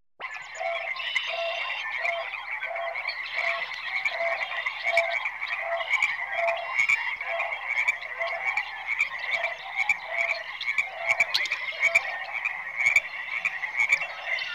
Nature - Animal - Frogs, Birds - Other Swamp Sounds Various; 101 Digital Sound Effects; Sounds Of Nature